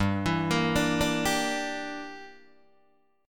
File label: G Minor 6th, Guitar Open D